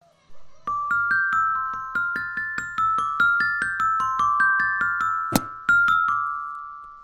μεταλλοφωνο

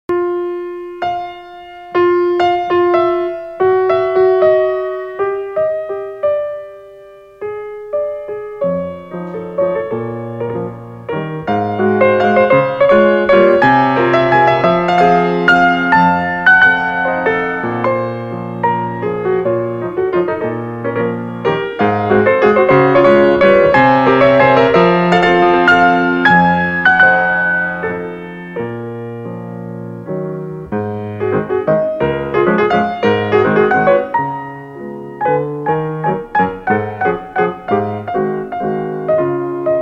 Ηχητικό δείγμα πιάνου Μαζούρκα σε σι ύφεση ελάσσονα

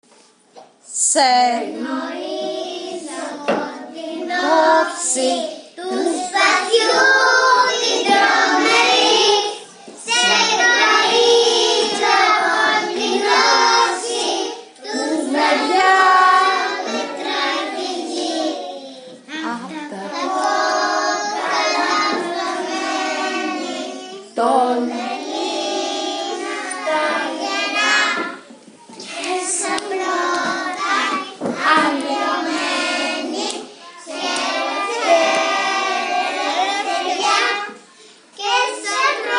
Εθνικός Ύμνος

